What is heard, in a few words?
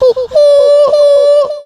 hoo hoo; Voice; ooh ahh